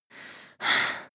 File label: breath female active aroused